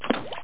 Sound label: splash